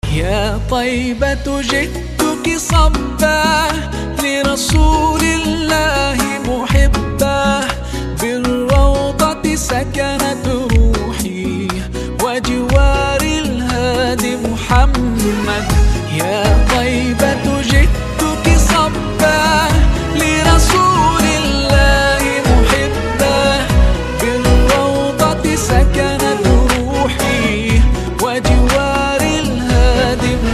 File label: (Arabic Version - Bonus Track)